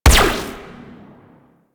Amp Chassis Fire, Amplifiers; Operators; Corp Amp Set One

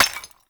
Clay Pot Destroy; Warframe; Awakening Remaster

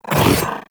Companion Melee Swipe, Pets; Warframe; Characters; Zanuka; Foley

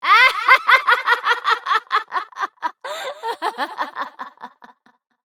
Laughter; Evil Twin D; Warframe; Entrati Doppelganger; Battle Barks

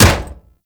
Staff Impact Armour; Warframe; Weapons; Impacts

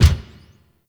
Gear Shift Up, Motorcycle; Warframe; Vehicles; Motorcycle Ninety Nine

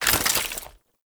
Bayonet Melee Whoosh Gear Accent; Warframe; Tenno

